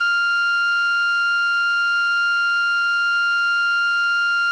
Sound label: CALLIOPE F4; Sonic Reality; American Heartland